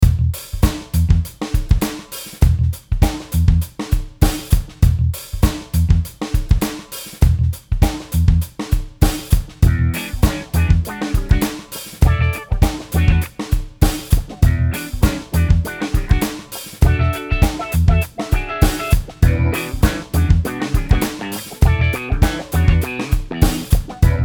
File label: funk filter, auto wah; axe-fx-ii; auto wah tip